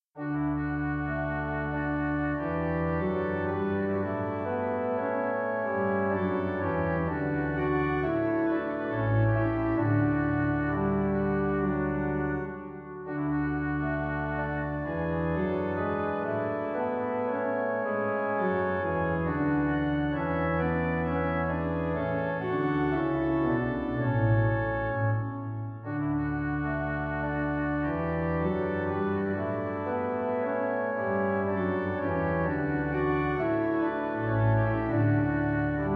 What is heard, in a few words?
Trio D major, organ; Krebs